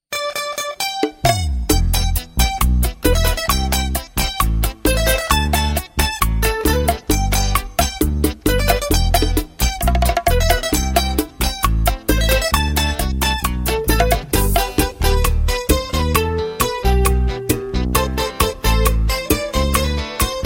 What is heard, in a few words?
bachatazo; latino